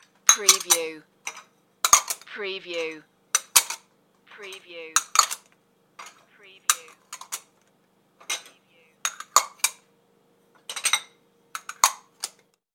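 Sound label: Stack Dish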